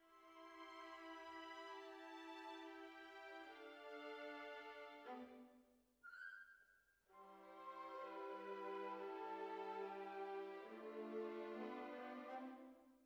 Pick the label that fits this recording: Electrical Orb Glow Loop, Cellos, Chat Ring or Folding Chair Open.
Cellos